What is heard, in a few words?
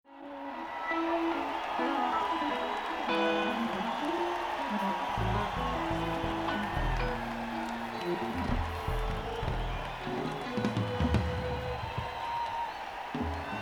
Tuning